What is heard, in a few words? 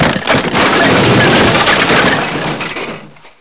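wood impact; svencoop; blackops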